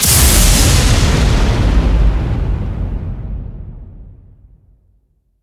lightning impact

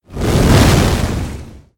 wind explode

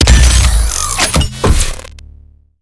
gauss fire; doom gauss

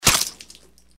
gecko claw atk; npc

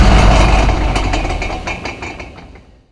stop

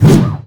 slash

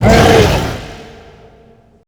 hurt; glasgavelen

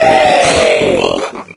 death, maggot; doom3

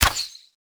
hornet poison needle

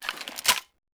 shotgun combat equip; weapons

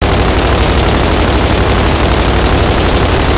lancer turret fire loop, turrets; enemy; gow

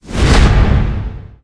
whoosh heavy

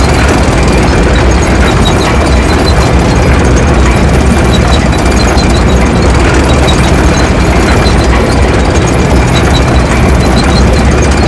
tiger tracks

